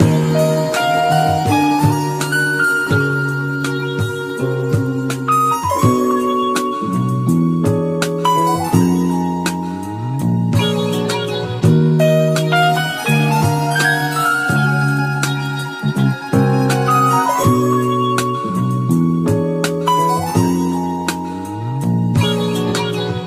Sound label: keys